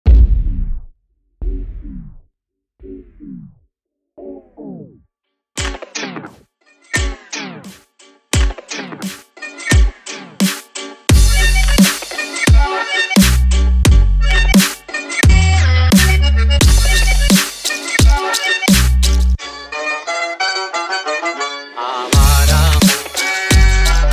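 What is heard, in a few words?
dubstep remix Hindi Bollywood old song; Locking Popping Hindi Bollywood Old Song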